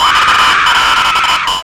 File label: death; slender; slendytubbie